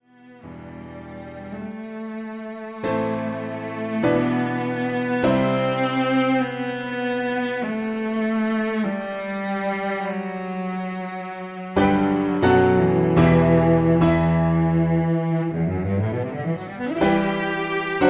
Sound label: Double Bass Sonata